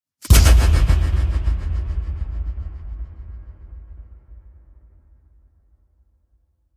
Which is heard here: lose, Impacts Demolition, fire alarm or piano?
Impacts Demolition